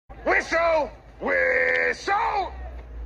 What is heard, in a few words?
whistle ringtone; roy kent